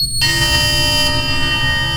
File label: sonar Ping Water Medium Beacon Directional; Barotrauma; Real Sonar